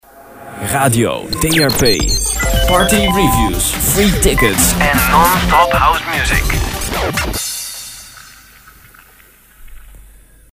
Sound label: Promo Jingle